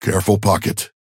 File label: ping careful synth